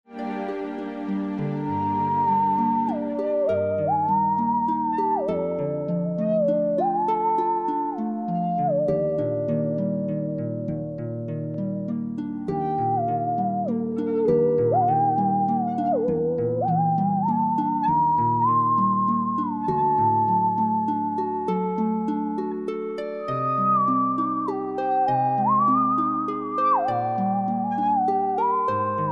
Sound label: musical saw